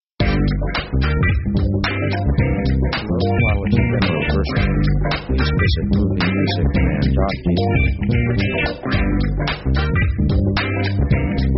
pop 110bpm funky score; comedy; Comedy themes

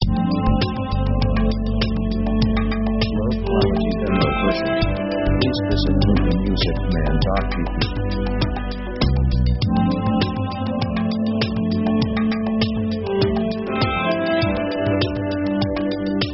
space, synth; synth themes

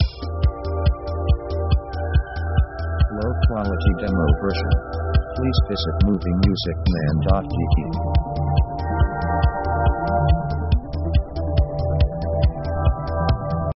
trance diva 140

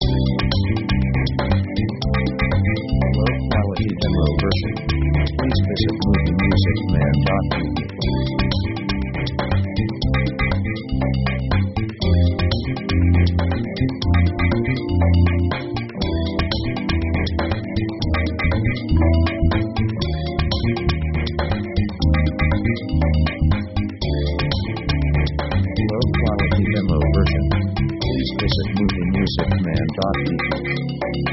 ethnic brazil

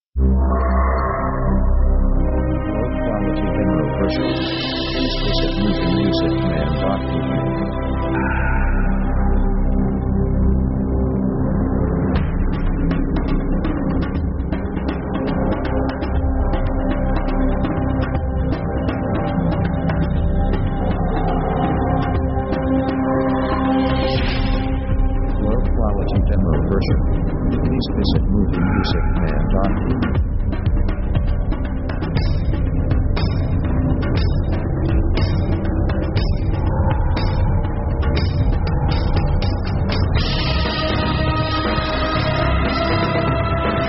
synth final power; victory themes

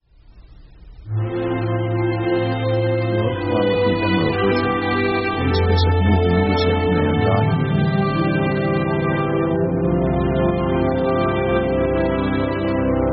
COMING HOME, slow

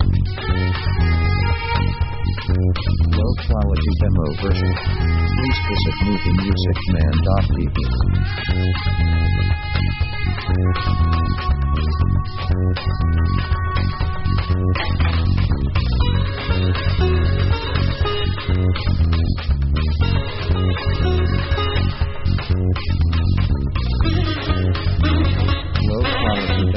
india marching; easy themes

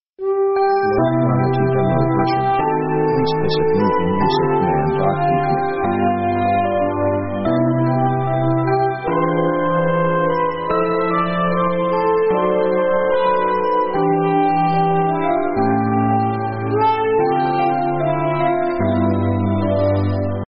synth jazz ballad; orchestra themes

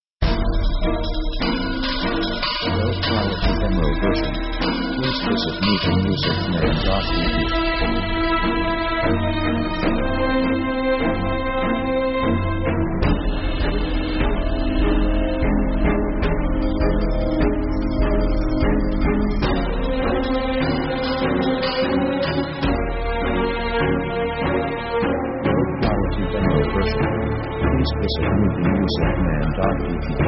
orc progressing thriller; Action themes

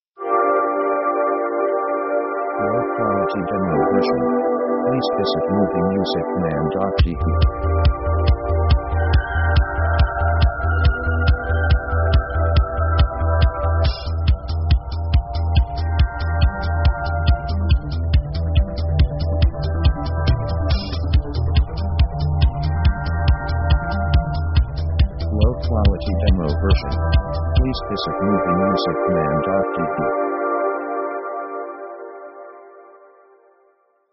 trance diva 140; synth themes

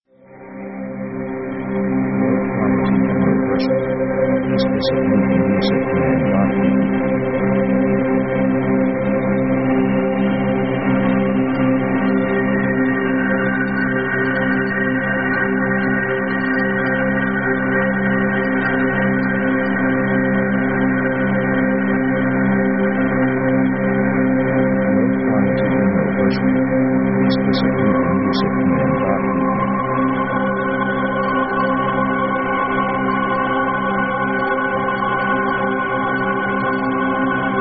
suspense; synth themes

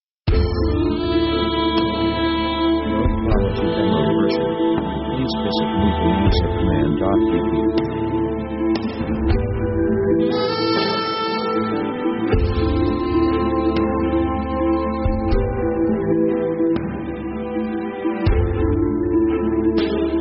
pop orc progress

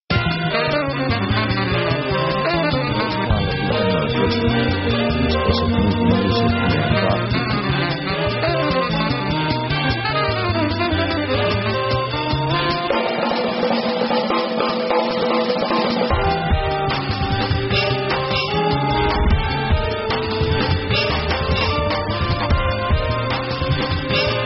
jazz jungle bop

